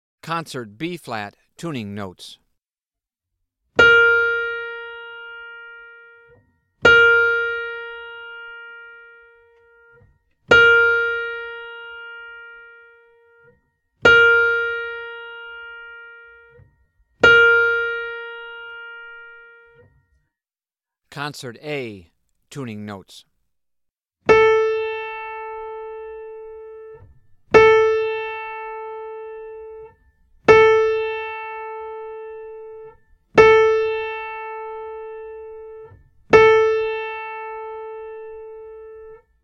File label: Tuning Notes; James „Jamey“ Aebersold Play-Alongs